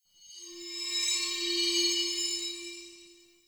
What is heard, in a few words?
Ancestor Tree Spirit Chimes; Soul Plane